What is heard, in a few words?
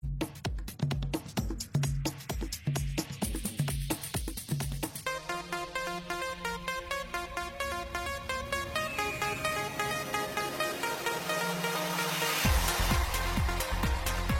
Instrumental Music